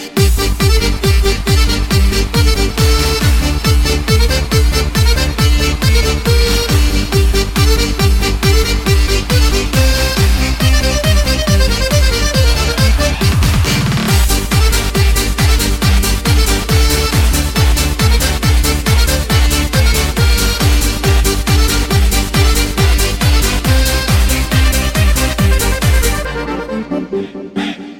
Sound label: Russian Kalinka